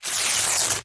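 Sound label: tearing sheet of paper in uncontrolled way; razrivanie lista bumagi neupravlyaemim sposobom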